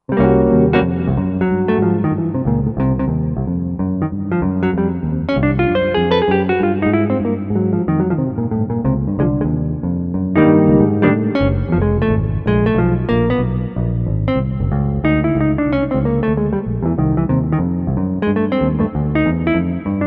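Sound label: Jazz Guitar Hall; Roland JV-2080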